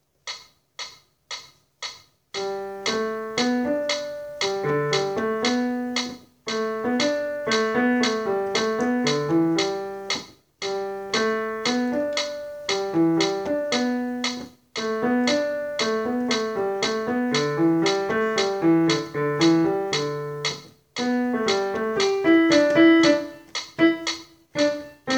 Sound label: Alto